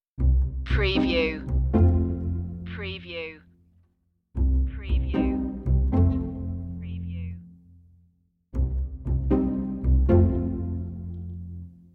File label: strings only